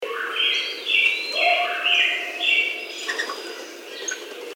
whoop, bigfoot

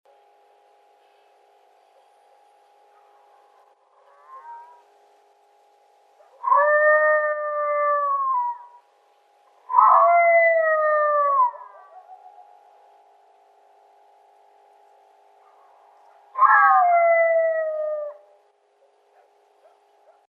Illinois Howl dehissed

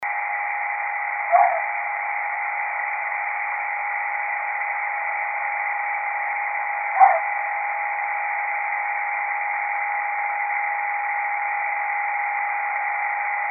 Red Fox; Stan Courtney

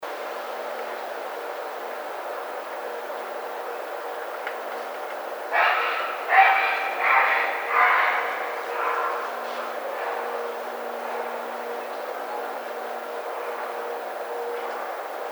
Great Blue Heron

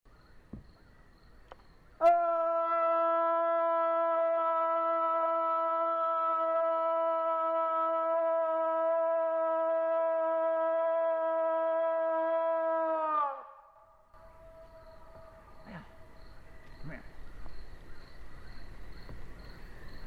voice, bigfoot

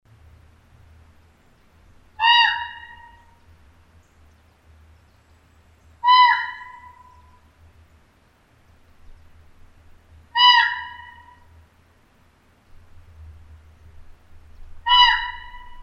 Common Raven

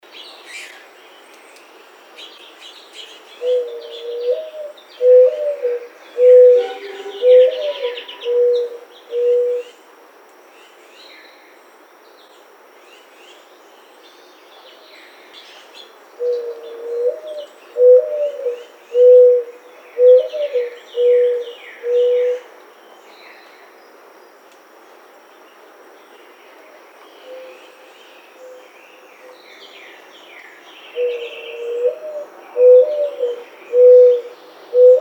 Mourning Dove, birds

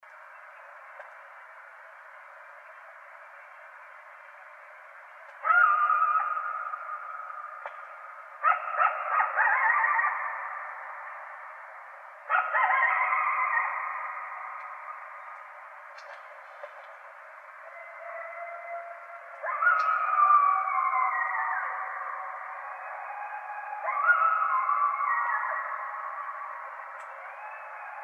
Coyotes; Coyotes (Canis latrans)

